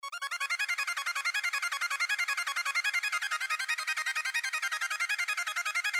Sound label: Arp